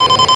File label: alert sound